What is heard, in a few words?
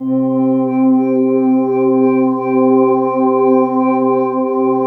PAD, 160 BPM, Drum & Bass; Ueberschall; Drum & Bass Flash Trackz